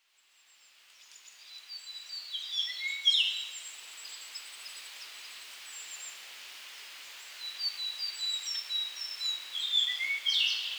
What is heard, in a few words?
bird